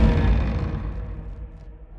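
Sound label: Music Volcano 120; From Dust; ps3; xbox 360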